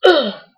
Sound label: die; raylib; drturtle